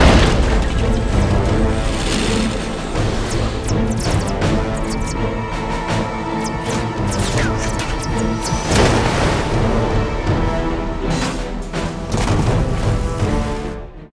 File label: Outro Sfx; Emmet